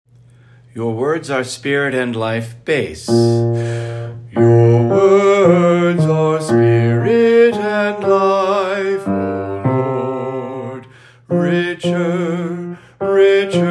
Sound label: bass, choir music; St. Anthony of Padua; Choir Sunday